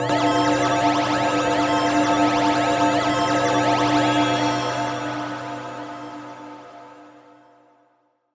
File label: Massive Pitch Glitch In